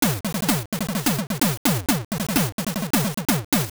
Instrument Drum; The Thunder Drum; Sirens Instrument

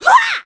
Hwah; Link; startled; Zelda

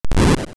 Wizzrobe Shoot Fire; Wizzrobe shooting a fireball

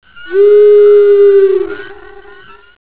Night Owl

